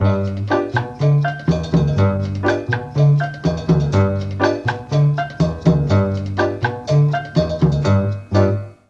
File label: D2 CHA FLT, mellotron